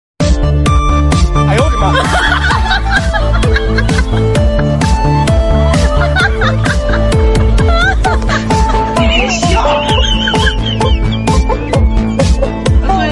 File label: laugh; breadradio